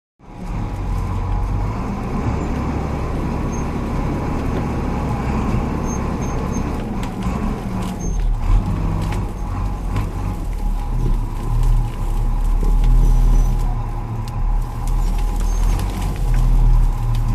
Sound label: Bulldozer; Start, Drive with Brush; Bulldozer; Working, Crunching, 'tire Crushing Vegetation' Noise, Metallic Squeaks, Close Perspective.